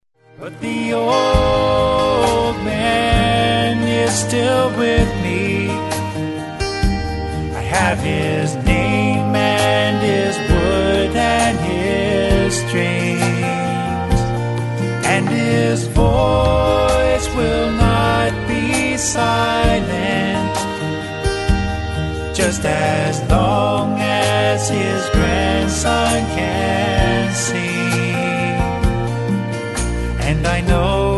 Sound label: Guitar; Granddad's Guitar